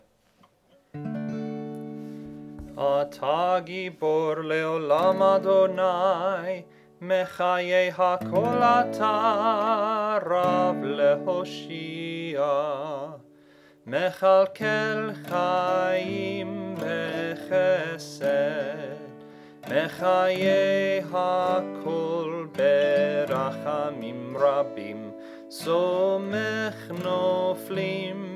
G vurot Low Voice; Hebrew

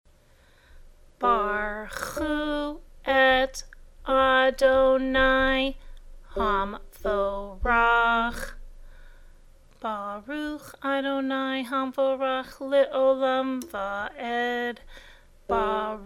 Before Torah Blessing Slow Speed

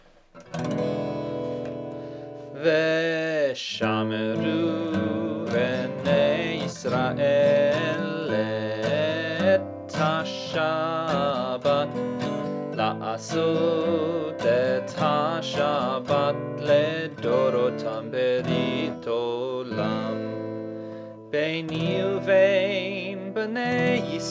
V shamru High Voice

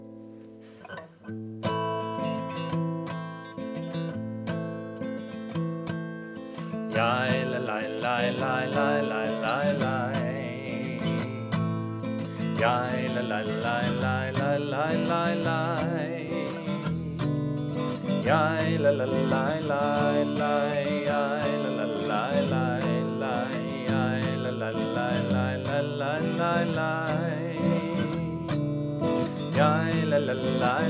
Barchu High Voice

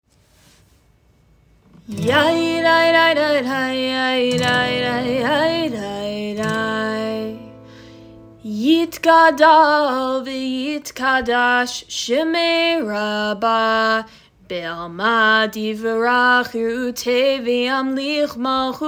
Chatzi Kaddish; Prayers

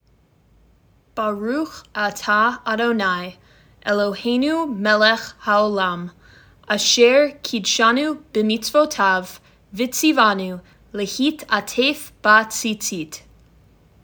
Tallit Blessing; Prayers

